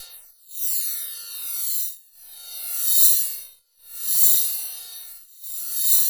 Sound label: METAL SWIRLS; Spectrasonic Distorted Reality 1